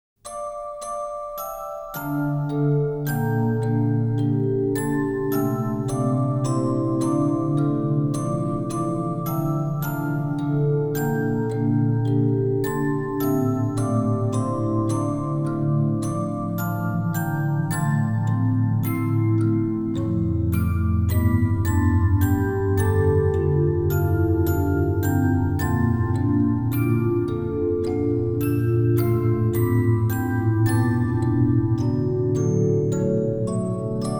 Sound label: Orgel; Sound of the Sky Crawlers